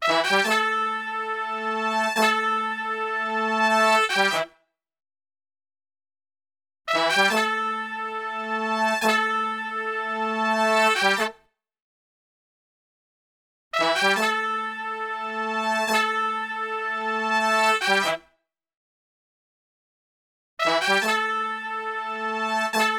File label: ROCK A M, Brass; Best Service; Brass Super Section; ROCK LICK